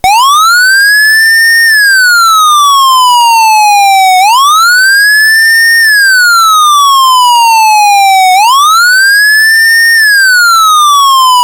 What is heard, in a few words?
WAIL2, LED warning bar; UltraLITE Plus Exterior LED Warning Bar